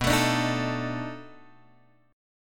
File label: B 7th Sharp 9th, down, Guitar, Open G